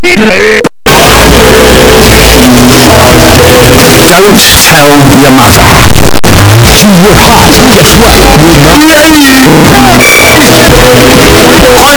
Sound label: earrape; garrysmod